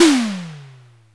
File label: TOM, Simmons SD-8 Drums